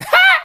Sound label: ah ha, laugh; ooh ahh